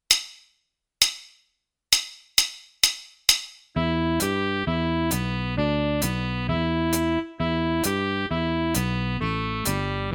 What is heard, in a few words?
Blues Scale Track